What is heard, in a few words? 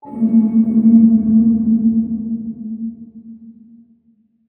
Sonar Ping Far; Command